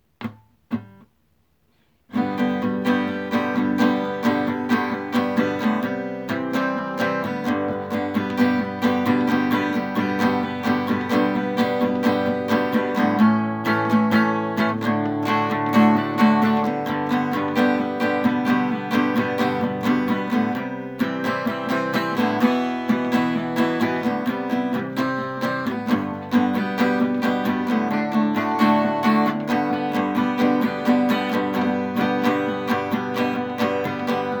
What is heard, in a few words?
acoustic; brick and mortar; pipeline grill